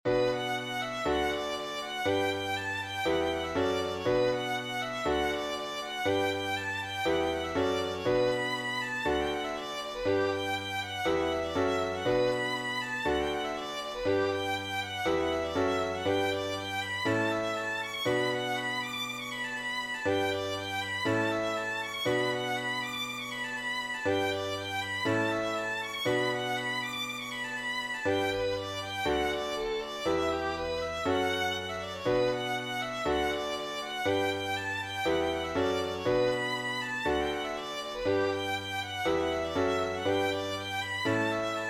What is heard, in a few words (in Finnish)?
polkka